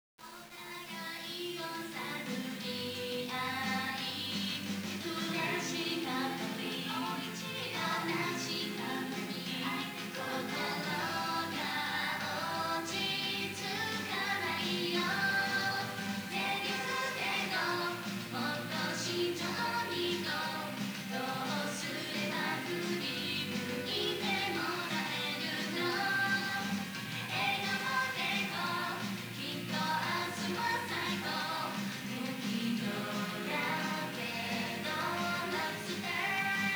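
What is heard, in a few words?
background music karaoke; usen; yakuza ryu ga gotoku kiwami 2 pc gamerip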